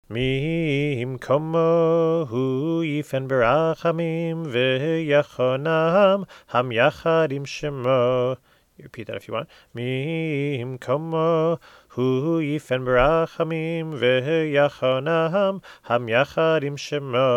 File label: mimkomo tune; musaf